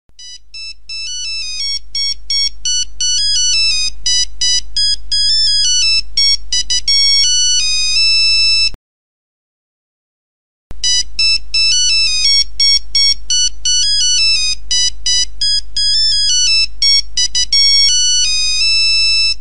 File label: we wish you a merry christmas old style